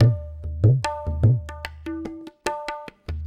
Tabla

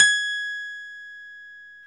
KEY MKS, Pop Pianos, Rhodes; Roland L-CD701; Pop Pianos 3

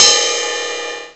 CYMBAL; M8; CMI Factory

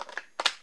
on off, Switch FX; Masterbits

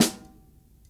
SD, dry kit, Percussion

